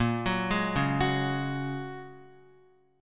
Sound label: Bb Minor 7th Sharp 5th, Guitar